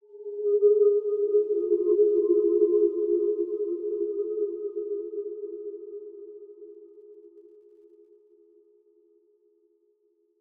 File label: Musical Melody; MinorHallutinationsSounds; SFX Producer